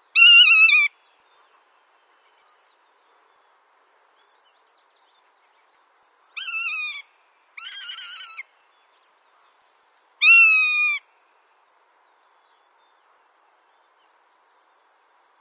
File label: Rough legged Hawk